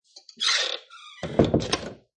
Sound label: Close Door With Creak; Creaky Door Sound Effects; Lynne Music